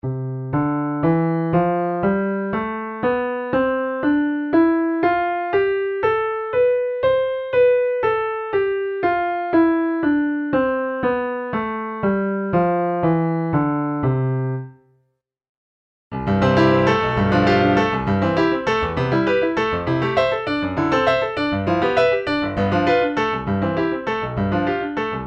piano; pianoteq pro 6

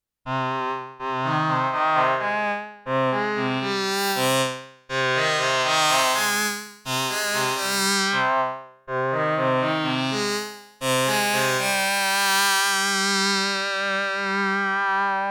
microfreak V4 Presets

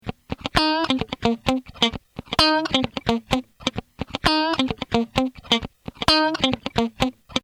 Funky Git LA 2A

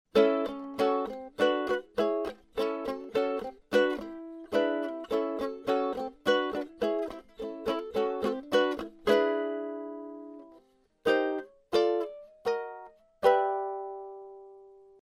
Ukulele 25 cm high; beyerdynamic FOX

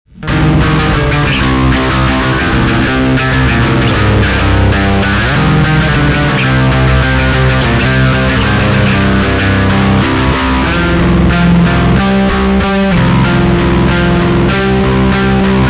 Sansamp hiloboost Char right higain

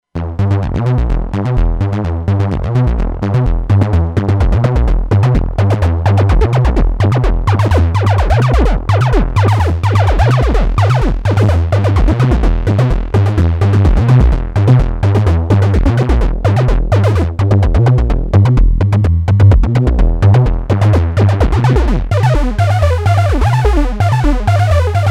ARP FX; Acid8 MKIII

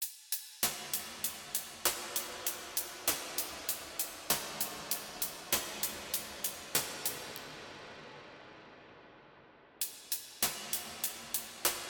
Eventide H9000 Blackhole

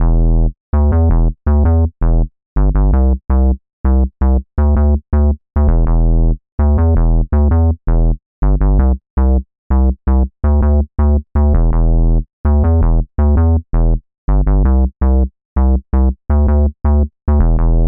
Bass A Refrain

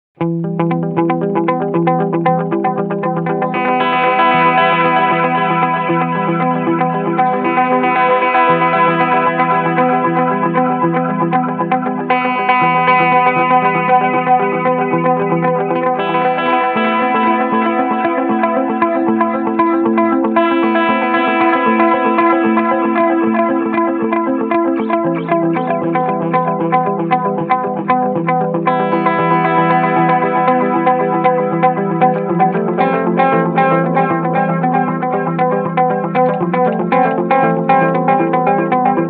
Catalinbread Echorec Head 1 Head 4

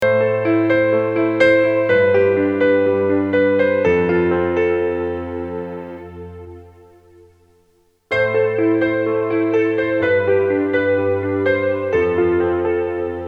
Grand Piano Light Vibrato; Boss CE-1

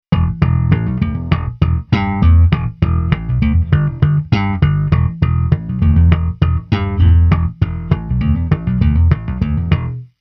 Rockson PB-66

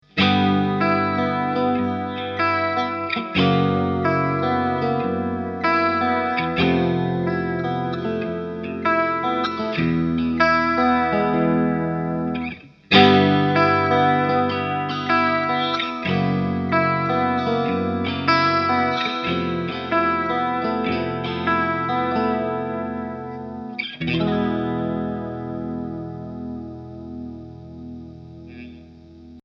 Walrus Audio Julia 3 9 12 v sinus

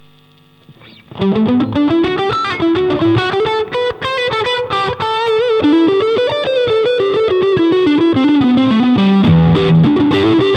gain Bridge Neck

